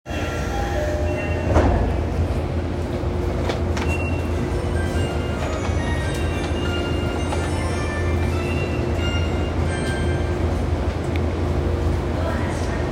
日比谷線入谷南, train sounds

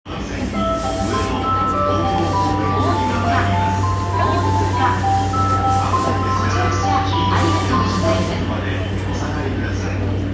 JR 山手線 大塚

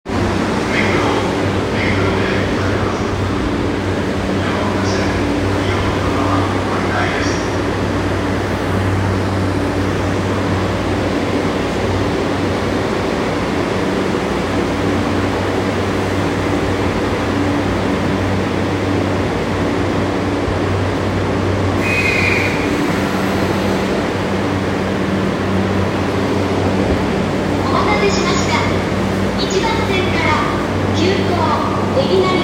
南北線目黒南

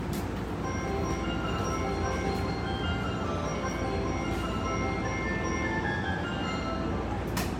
JR中央・総武線東船橋西, train sounds

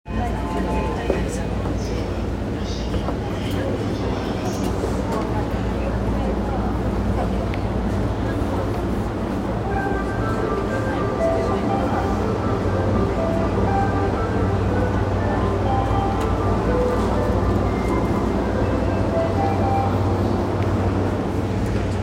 JR 山手線 田端 CW, train sounds

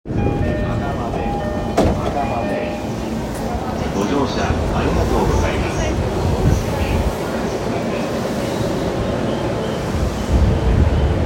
JR宇都宮線赤羽北, train sounds

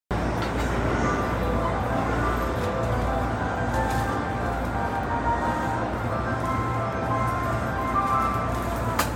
京浜東北線桜木町北, train sounds

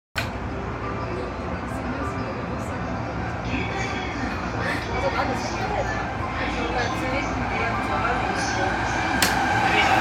京浜東北線東神奈川北, train sounds

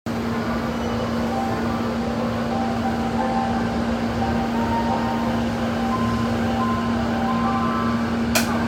京浜東北線王子北, train sounds

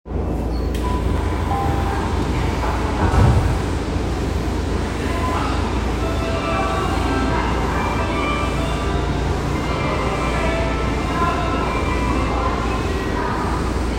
副都心線地下鉄赤塚北, train sounds

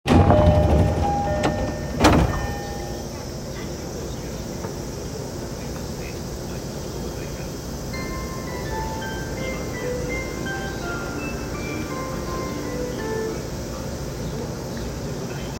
東海道線 大磯 南, train sounds

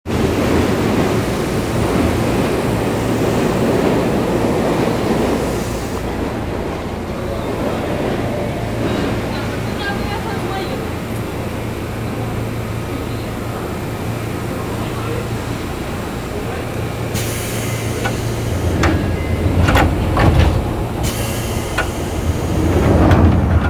西武新宿線中井駅東, train sounds

